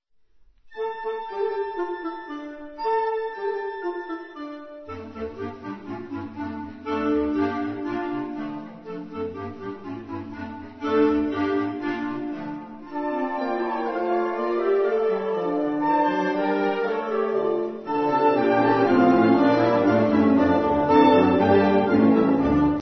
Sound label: russian folk songs